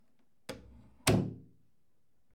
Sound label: Door Close Slow